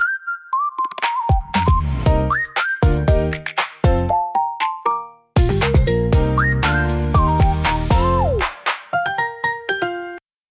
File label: Pop marimba catchy; identite sonore ia